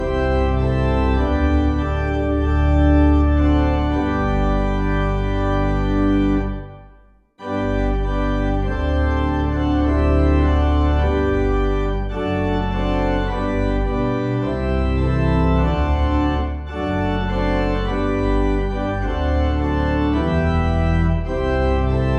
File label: Hymn, organ; Sing my soul his wondrous love; St Bees m